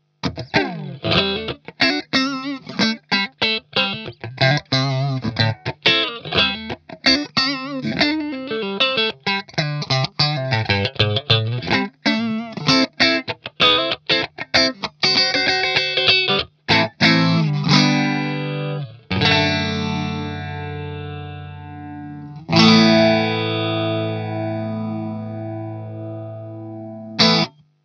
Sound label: hx deluxe phase